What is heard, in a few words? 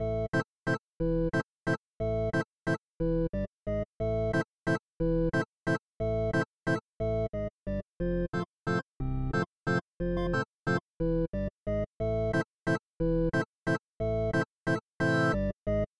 Haas, Wet